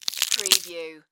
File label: Carcass Flesh Rip